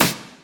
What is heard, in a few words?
shiny steel snare drum f key, Royality free snare sample tuned to the F note.